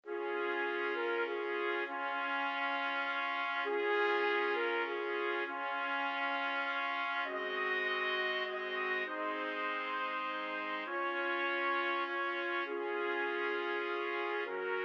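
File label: 4 trumpets; trumpet quartet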